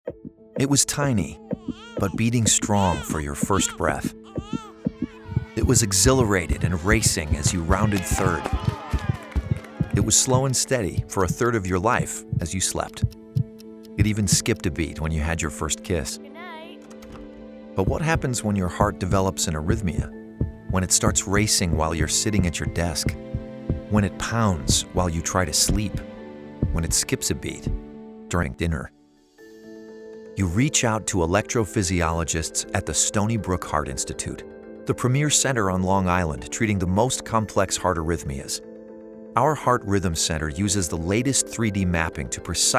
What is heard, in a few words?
Beat Styles Heart 60 sec